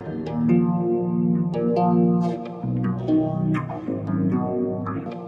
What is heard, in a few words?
guitar, Jazzmatazz Loops